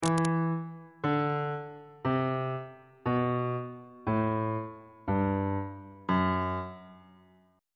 f sharp locrian mode descending

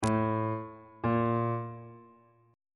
g double sharp perf1 aug1 intervals

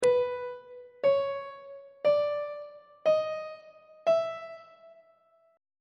b 3rd interval pitches on treble clef

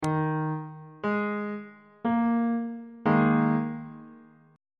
d sharp suspended 4th chord on bass clef

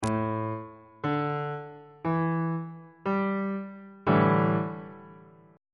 a dominant 7th suspended 4th chord on bass clef

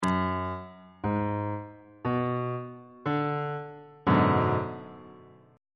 b minor 6th 2nd inversion on bass clef

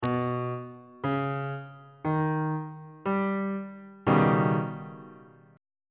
e flat augmented 7th 2nd inversion on bass clef

